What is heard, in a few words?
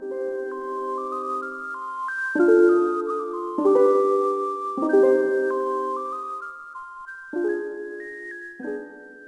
city jig; DAoC